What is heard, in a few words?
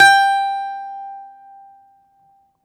BALALAIKA, Russian Strings